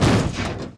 Small Impact; Totaled; XBOX

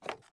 fs creakwood, footsteps

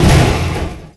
Impact Medium; Totaled; XBOX

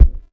step carpet; people; Pirates Of The Caribbean

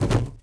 bodyfall mtl; foley

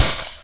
Plasma Eject, Plasma Rifle

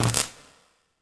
Electric Spark; Incidentals; Mace Griffin Bounty Hunter; XBOX

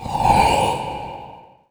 menu down; Stake Fortune Fighters; XBOX

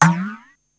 Boinky; Finding Nemo; XBOX

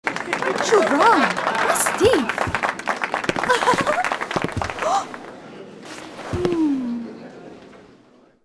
dance outro good; Sid Meiers Pirates; XBOX

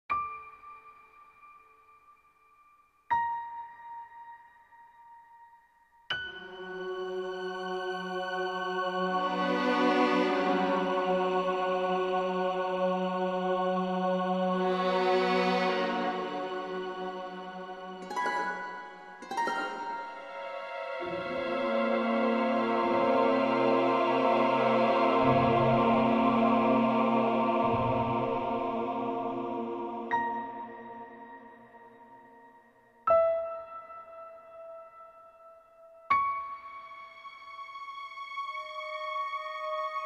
Mellow Amb; music; The Da Vinci Code; XBOX

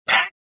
pain, rodian

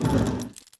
suspension, car; Cars; MATER; XBOX

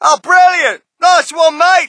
crowd, shout; Mike Tyson Heavyweight Boxing

